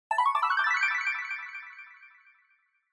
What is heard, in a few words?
Scene Change Delay Slow